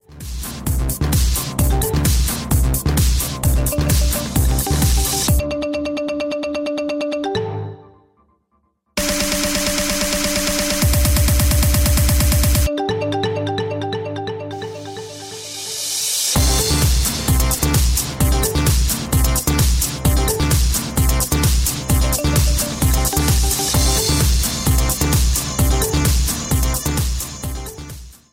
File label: messenger mix ringtone; android